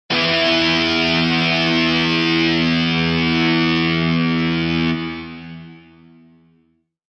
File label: Loop Electric Guitar End Loop Em 98, Electric Guitar Loop In E Minor At 98Bpm.; Guitar Loops; Electric Guitar Ending Em 98Bpm